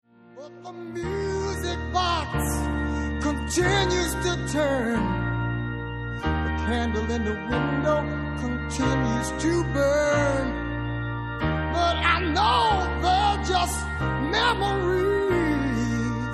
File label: music box blues